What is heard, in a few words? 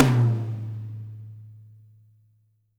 TOM 2J R; TOMS; Spectrasonics; Burning Grooves; BG TOMS